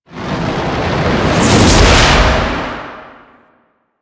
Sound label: Void Comet Whiz By; Warframe; Void Storm; Railjack